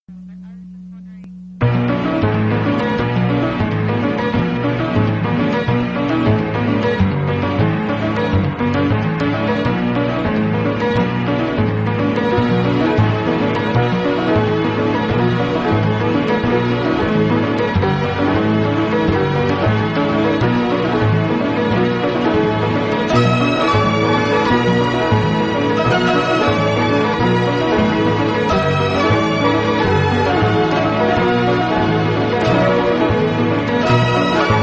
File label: Instrumental